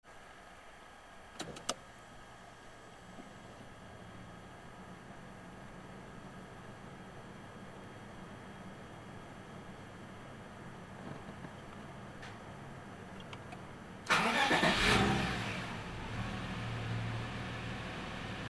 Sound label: ENG START inside madoake syako; 30RspecB